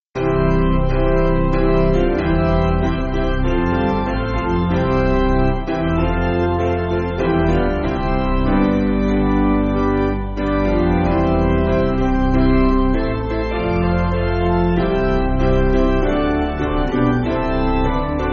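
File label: Piano, Music Backing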